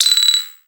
Multiple UI Beeps

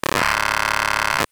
Digital Buzzing Rattle Glitch

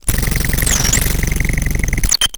Mechanical Malfunction Rumble

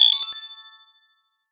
classic game reward

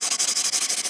Hard Slide Multiple

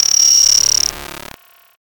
Digital Buzzing Rattle Glitch

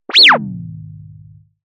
Cartoon Squeak

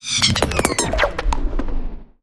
Sci-fi Malfunction Rumble

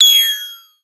Single UI Beep

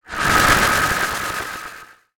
Oscillating Sci-fi Transition

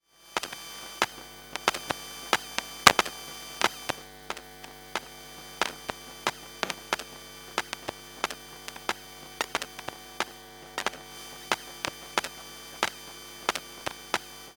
Long Buzzing Soft Popping Power Glitch

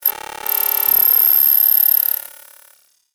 Digital Buzzing Rattle Glitch

